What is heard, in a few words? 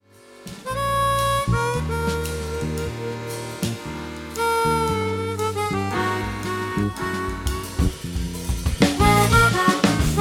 punk jazz